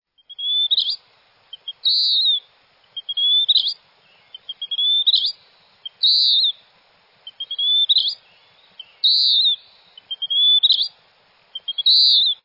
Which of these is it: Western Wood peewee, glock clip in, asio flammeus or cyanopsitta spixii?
Western Wood peewee